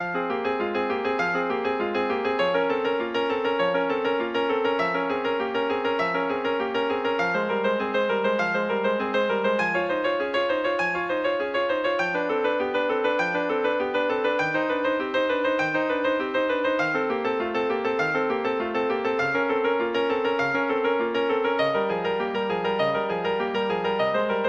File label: prelude c minor, flute